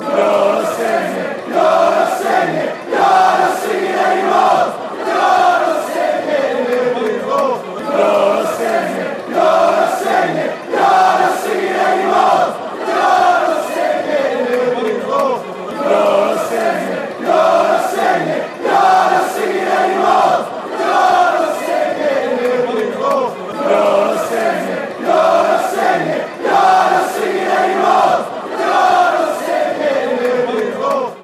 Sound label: youre not singing anymore fanchants